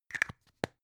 plastic spray can small close slow

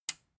metal button tiny push disable

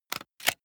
plastic device handling lock double

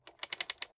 rotary control short quick turn soft

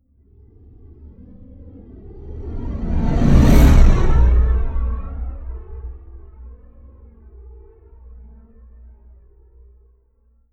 whoosh race

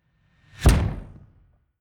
incoming kick drum

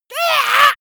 monster painful cry high

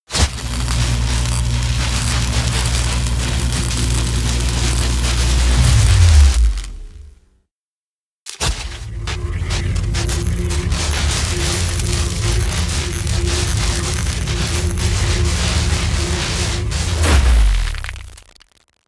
disintegrate

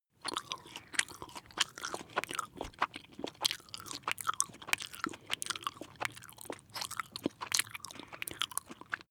chew jellybaby smack male

